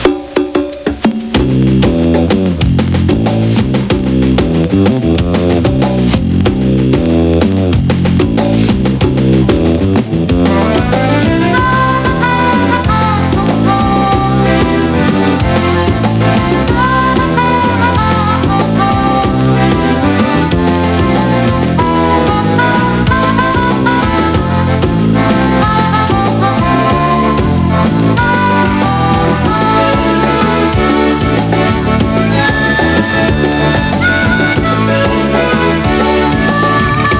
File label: moose tune